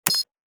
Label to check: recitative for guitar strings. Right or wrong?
Wrong